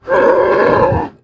bear

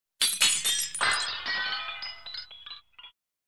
break glass

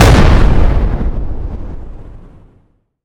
explosion grenade; ufoai; weapons

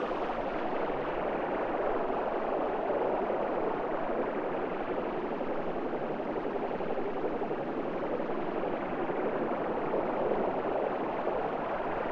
alien device

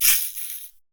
hurt, aliens; bloodspider

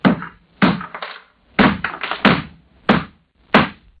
hammer

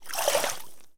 splash, footsteps

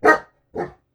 kobold bark

